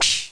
Atom Descent; Chiral

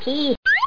TEETH whistle

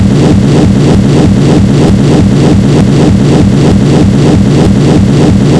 WAVES; explorer

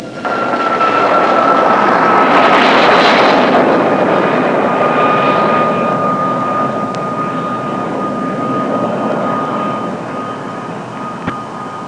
sm plane, transportation

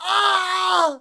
plyr ht; quake; halo; hurt

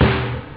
pop, snare; OctaMED SoundStudio V1

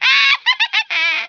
laugh, Italian; 11khz; Speech; International

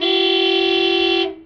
honk; BESTSHOT

